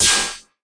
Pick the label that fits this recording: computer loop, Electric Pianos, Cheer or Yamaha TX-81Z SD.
Yamaha TX-81Z SD